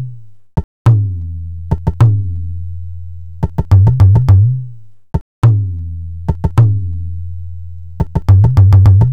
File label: Low Tabla 105; Percussion Elements